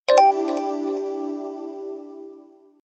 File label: allert email